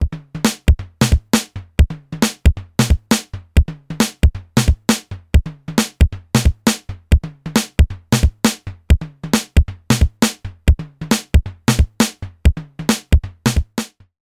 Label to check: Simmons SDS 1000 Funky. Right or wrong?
Right